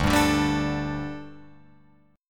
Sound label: D Suspended 4th D, Guitar, Open F; strummed